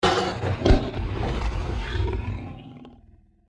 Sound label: deathclaw chase; npc